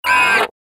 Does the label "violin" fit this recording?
No